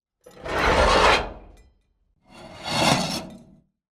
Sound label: Just Metal Scrapes and Scratches; soundbits